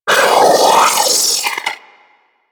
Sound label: Sentient Battalyst Vocal Attack; Warframe; Sentients